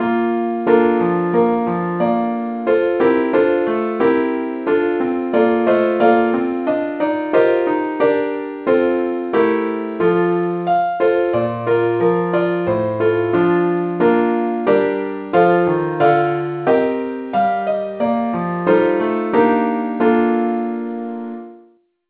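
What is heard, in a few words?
keyboard only key B flat; saintthomas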